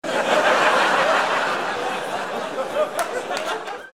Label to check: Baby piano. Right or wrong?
Wrong